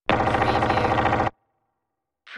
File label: Machine Gun